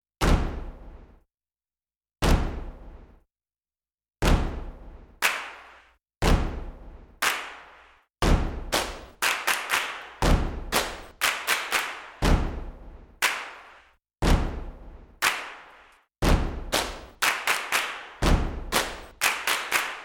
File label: Fast Perc Only